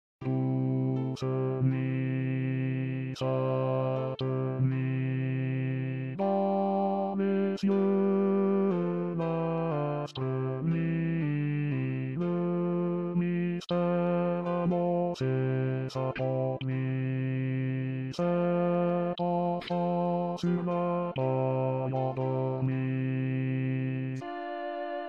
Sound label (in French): douce nuit b